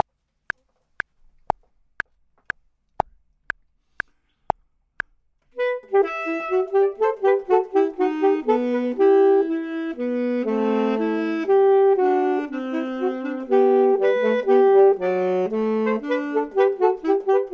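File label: both, Saxophone and Clarinet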